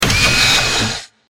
大型ロボットの駆動音

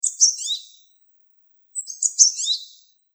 シジュウカラ

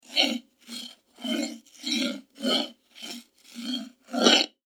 地面に魔法陣を書く

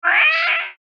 ネコの怒り声

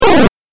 8bit 下降

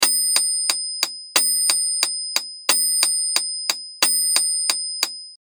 メトロノーム BPM180 を4小節

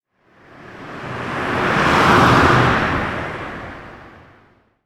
車通行音 中

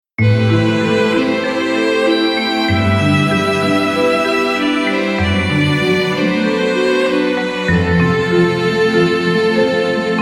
ファンタジー 悲しみ

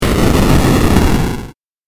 8bit 爆発

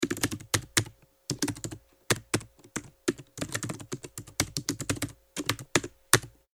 タイピング-パンタグラフ長

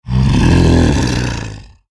クリーチャーボイス

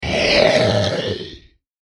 クリーチャー ステレオ

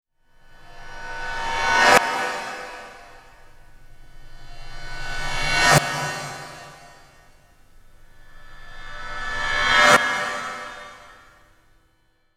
金属音逆再生連続

